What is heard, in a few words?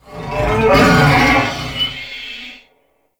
Wolf Death; Animals; Duviri